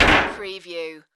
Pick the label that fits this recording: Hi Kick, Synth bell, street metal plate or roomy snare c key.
street metal plate